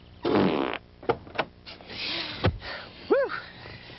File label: fart